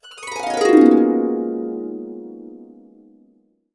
GB MAJ, Harps; USB Soundscan vol.62; AKAI

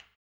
HAMBONE; ILIO; Vocal Planet VOL-2; Blues & Soul

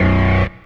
DANCE SYNT, techno trance

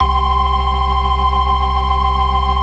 FAST VIB, ORGAN, TREMOLO VIB

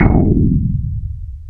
MKS80 ICE; Rubber Chicken Software Sample Bank

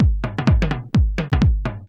808 tom swing; Sonic Foundry (Sony Creative Software); Ugly Remnants VOL-2

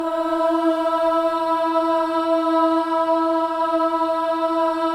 BOYS AHS, Symphony of Voices

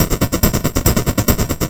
Crushed Perc (140BPM); bitcrushed; musicradar

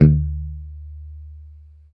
E3 sus, Strat guitar; Spectrasonics; Hans Zimmer Guitars VOL-1; JC-STRAT MTE

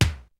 kicks snare, kid; Kid Nepro; Elite; KICKS SNARES

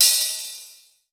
HO, Casio CTK-5000

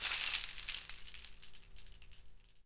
BELLTREE

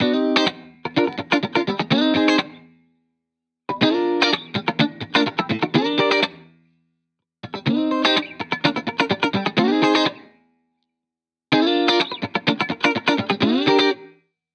Guitar Bpm125 Key C min, 70s Guitars; Fox Samples - Back to the 70s Guitars

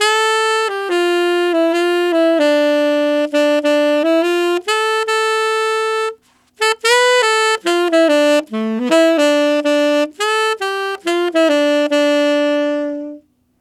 Tenor Country in D min, Tenor Sax Loops; Sonic Foundry (Sony Creative Software); Jazz Solos and Sections

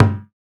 SUNU, African Perc; Spectrasonics; Supreme Beats; African Contemporary; Afro DRMs

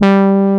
ANA BRASS; Pro-Rec - Synthsonic; JV ANALOG1

